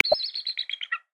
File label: White-Bellied Sea Eagle, Australia; Xiaomi Redmi-Note-8-Pro